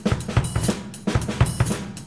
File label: rock beat